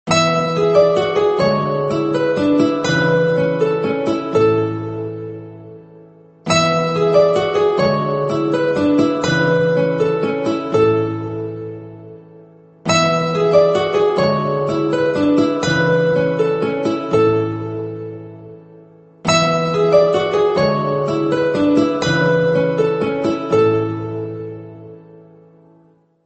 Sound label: nokia theme ringtone